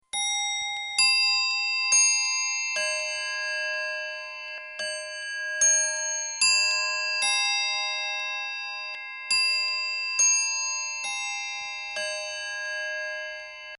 Clock Mantle Three Quarter Hour Toll; Clocks; Bobby Cole

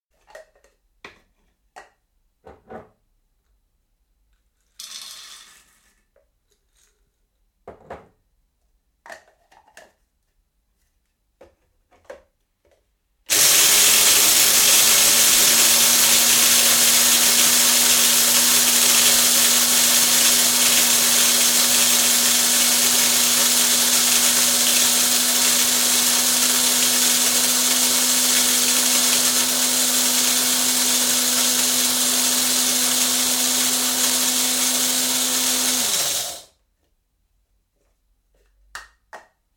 Coffee grinder filled & operated; Appliances (Sound Effects:Household); BBC

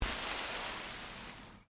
LEAVES MOVE MINOR, Rustling

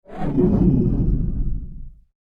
Low Ripple Whoosh

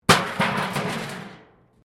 metal rubbish bin smash concrete; Materials

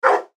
Dog Bark Distant; Animal; Dog Sound Effects

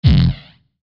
Hit Bass Flange Filter; Production Element Imaging Element Accent Transition

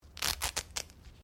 Clothing Velcro Rip Sequence; Movemement sounds for various articles of clothing; Ripping; Objects

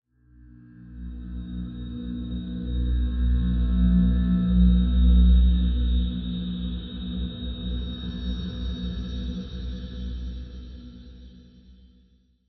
Swell Tension Drone Creepy, sound design; Swells

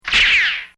Cartoon Sound Effects Shot; Cartoon Sound Design

